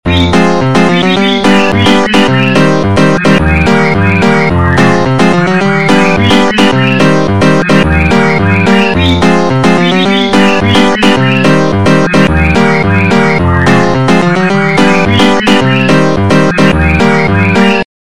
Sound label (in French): type beat